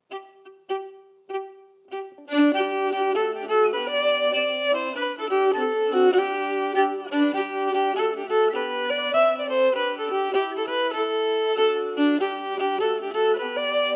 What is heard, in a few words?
Piano audio melody emphasized